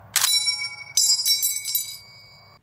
m1 grand pinging

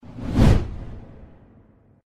whoosh sound effects

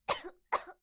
female coughing

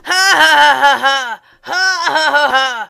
laughing; bfdi

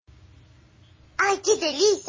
incoming from conference

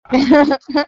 laugh

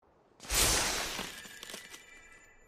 ice blast

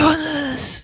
spooky

